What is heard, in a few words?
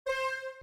Key, Synths